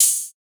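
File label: open hi-hat, Drums; One-Shots; HiHats; Ghosthack; Color Bass Essentials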